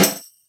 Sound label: snare tambo